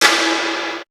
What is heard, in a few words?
Tom, Drum One Shots; Coldcut's Kleptomania VOL1